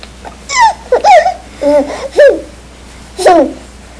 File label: laugh shorter